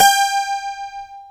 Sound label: G-1 ON, MINI 12.GTR; AKAI S-Series CD-ROM Sound Library VOL-7